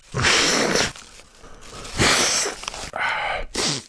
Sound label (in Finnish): niistäminen